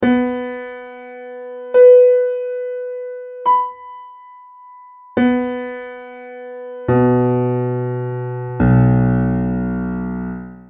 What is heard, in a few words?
piano